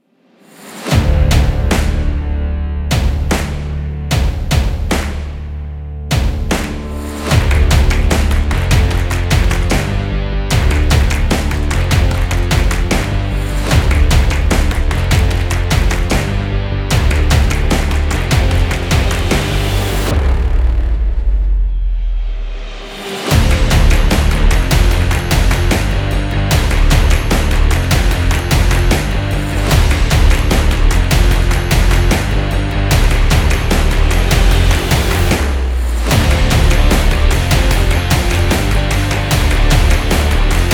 Stomp Rock

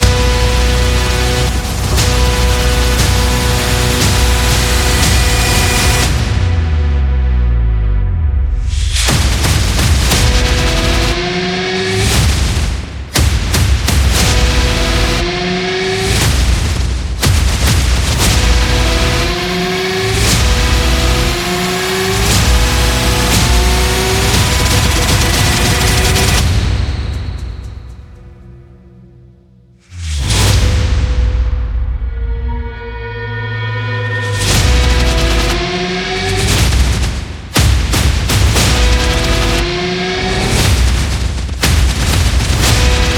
Intense Trailer; intense trailer themes

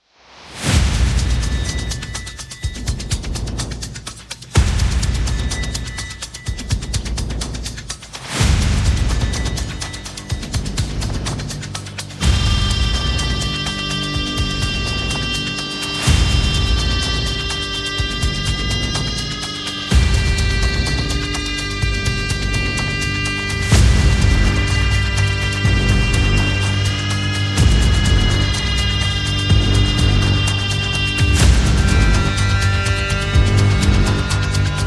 Battle Trailer; intense trailer themes

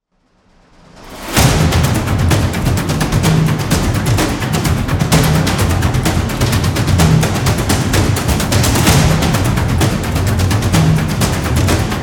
Cinematic Epic Drums; intense drum beats

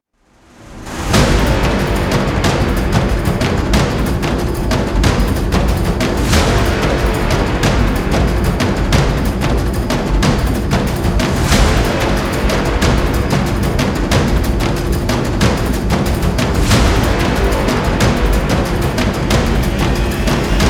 Powerful Drums; intense drum beats

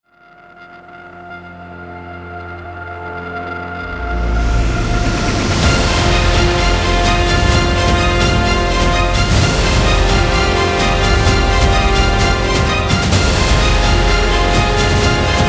Epic Trailer, epic movie trailer music

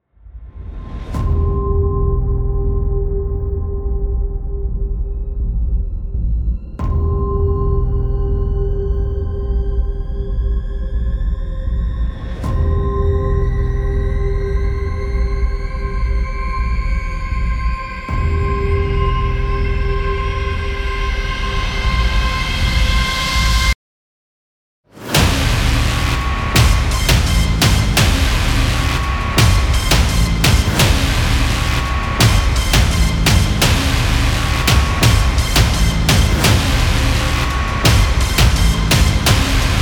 Dark Horror Trailer; scary background music

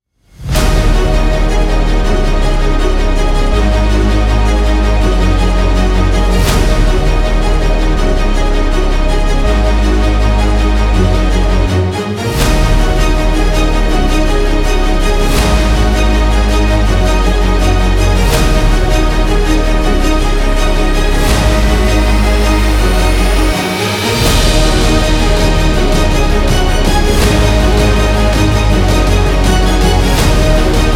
Heroic Background; epic background music

